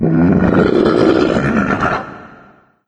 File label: mancubus sight; doom3